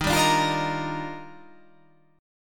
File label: D sharp Major 9th